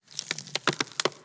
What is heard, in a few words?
Damned Tourment Skull